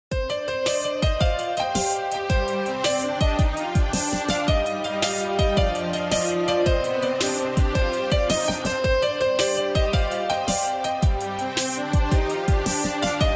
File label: bgm instrumental